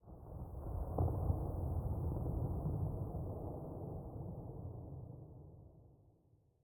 玄武岩三角洲地表声; ambient

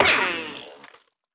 犰狳死亡声

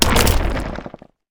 嘎枝攻击声

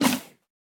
哞菇进食声

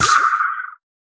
河豚死亡声

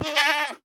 山羊受伤声

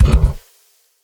骆驼冲刺声

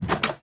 木门打开声

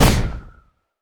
风弹爆发声

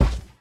熊猫脚步声

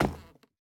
竹木踩踏声

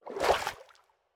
海龟游泳声

